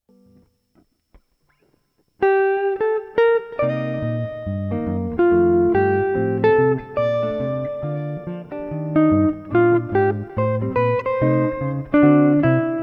autumn E melodia no batt

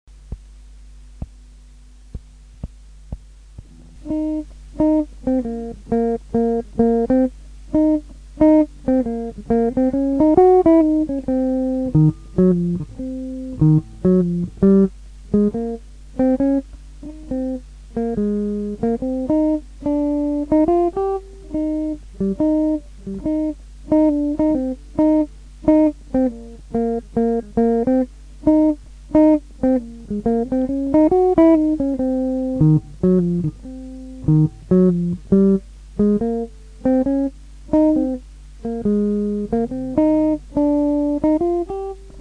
blues tema

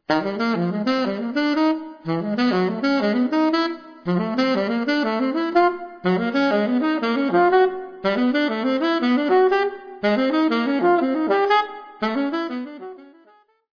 terzine, Sax

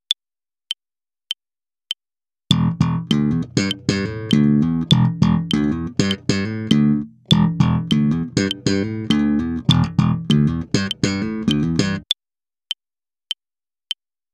slap, BassoElettrico

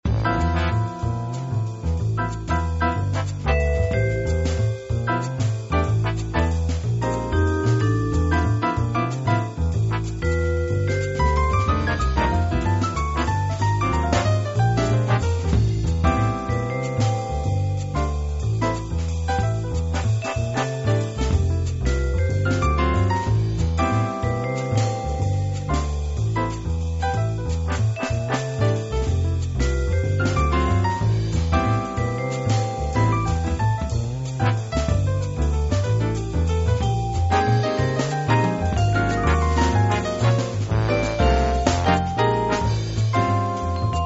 6 ottavi jazz

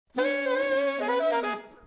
growl gola, sax

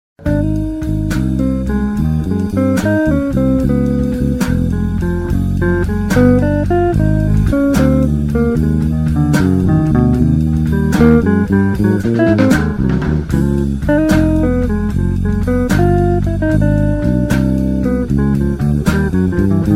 blues la bemolle

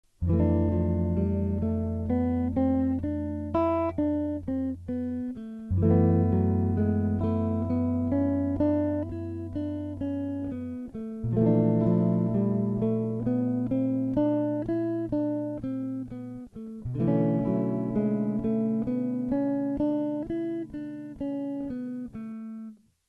esercizio su

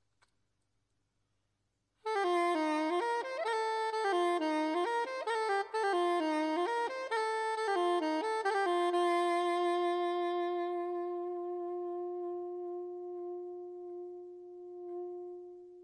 esempio vibrato musica etnica

